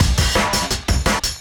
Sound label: Beat Style E 170, breaks; 170bpm